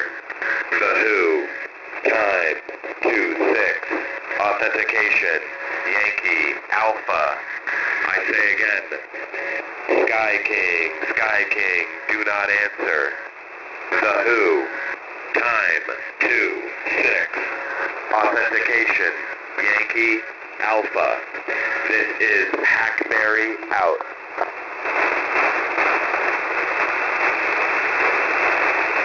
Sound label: usb; Hackberry